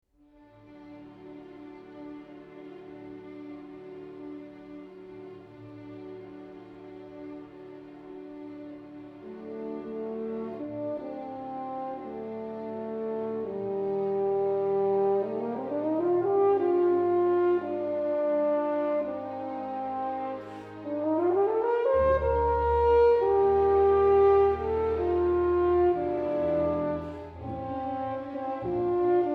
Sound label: Suite for Horn